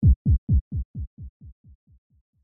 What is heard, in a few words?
Pulse Bass